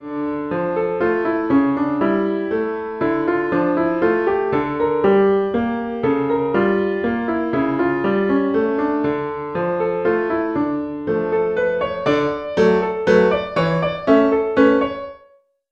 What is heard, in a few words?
intermediate piano solo